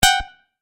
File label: Tap Error, tapping